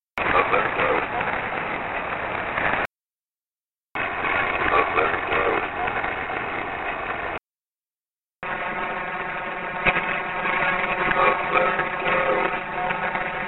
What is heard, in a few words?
evp cemetery reversed; belton